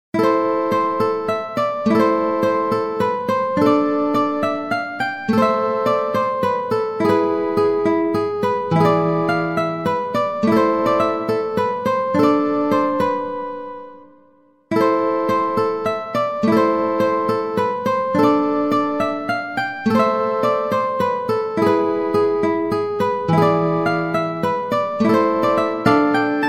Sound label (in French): guitare solo